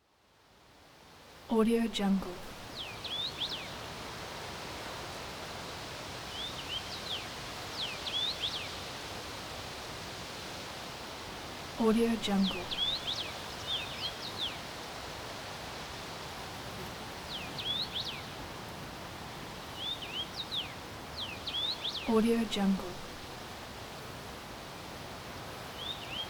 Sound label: nature birds wind ambience